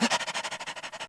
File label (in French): chien